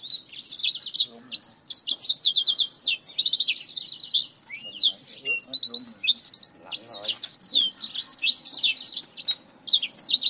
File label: Black Throat Finch